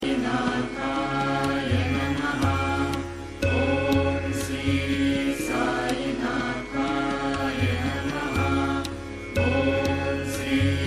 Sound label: sai baba tone ringtone